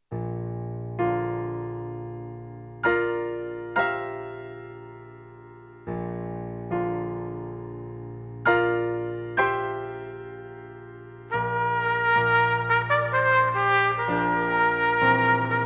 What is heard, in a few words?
SSAATTBB trumpet piano